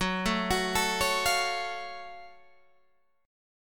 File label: F sharp Minor Major 7th F sharp Guitar Open G